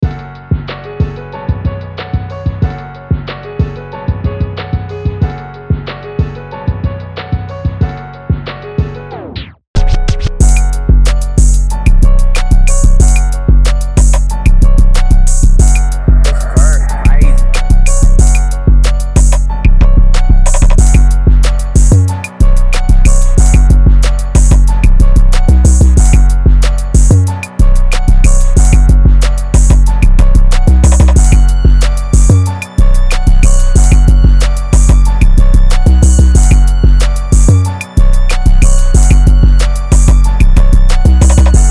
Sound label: wealthy mind 185 f minor, detroit type beat